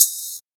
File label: shaker sound sample g sharp key; percussion